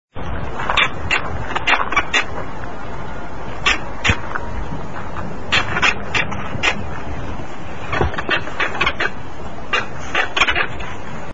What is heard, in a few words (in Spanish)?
carancho